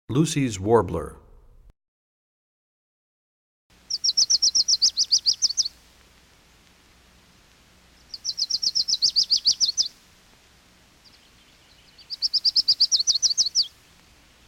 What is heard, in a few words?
Lucy's Warbler, bird songs; 101BirdSongs